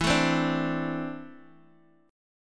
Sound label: E 7th Suspended 4th Sharp 5th E down Guitar Open G